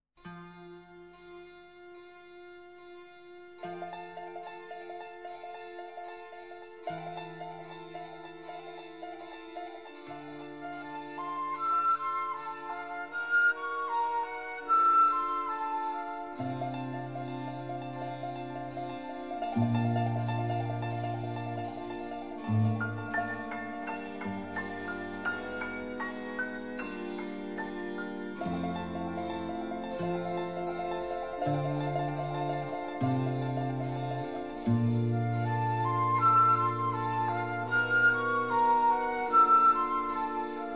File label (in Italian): piccolo interludio